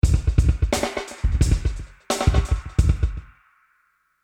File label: drums slapdash